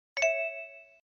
New Message Tone

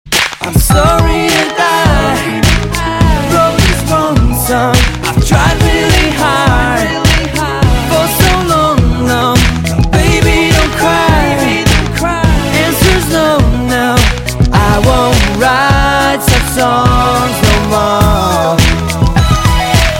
Radio Song

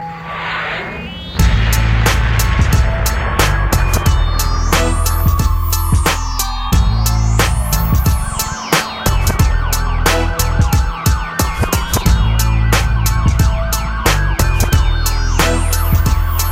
Albanian Hiphop Beat

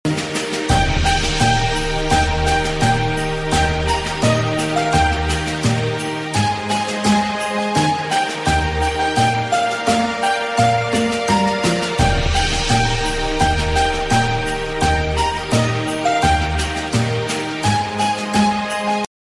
Jingle Bells

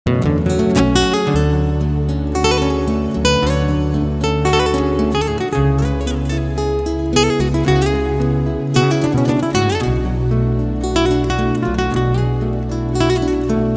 Romantic Guitar

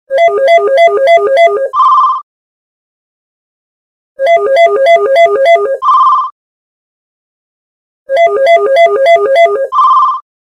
Nokia Classic

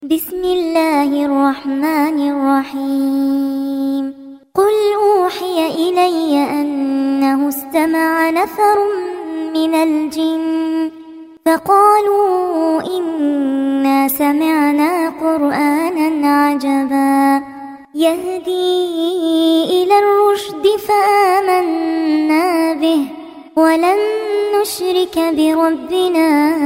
Quran Girl

